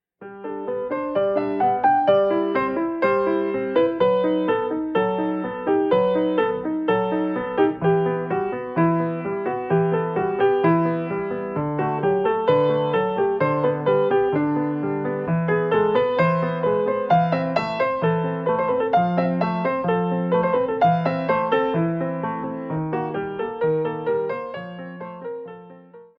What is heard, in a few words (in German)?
Ein bisschen Klassik